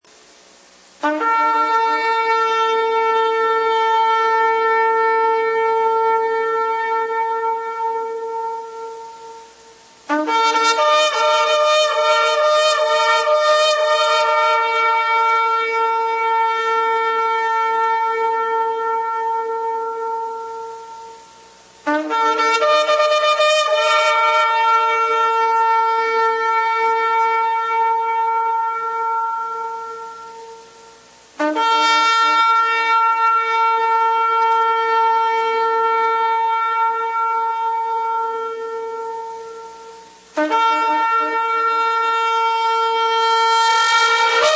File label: shofar coronation; Blessing Cards